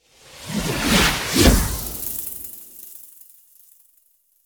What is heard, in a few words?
secondlife teleport